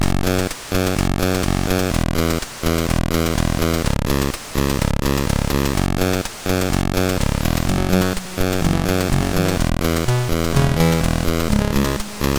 sid MOS6581R3; Studio 64

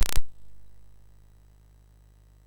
Project Firestart, MOS6581R4

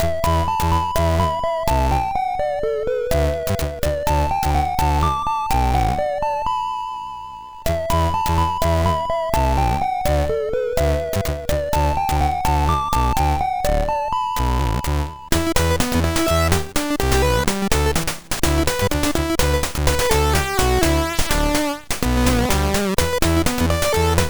Bzzz sid MOS6581R2

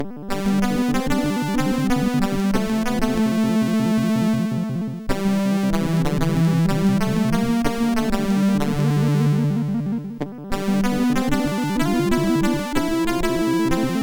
Shift, MOS6581R4

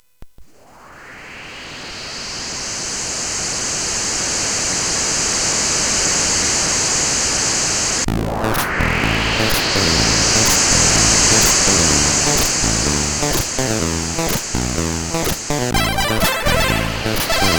Wind, CSG8580R5

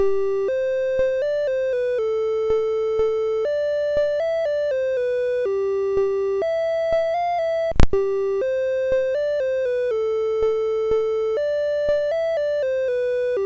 Merry Christmas BASIC, MOS6581R3

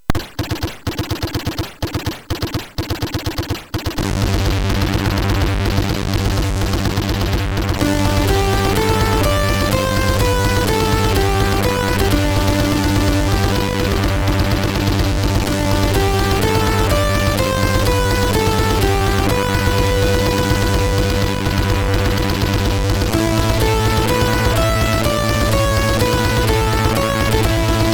War Music, MOS6581R3

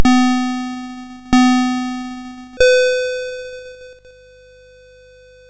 Buggy Boy, MOS6581R2

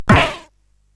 SFX; Narco Police

